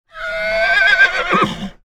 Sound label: horse neigh; sound ideas